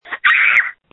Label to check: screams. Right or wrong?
Right